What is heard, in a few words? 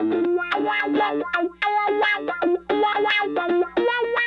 Raw Funk Guitar